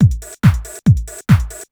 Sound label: BASIC, HOUS TEC DRUMS; MAGIX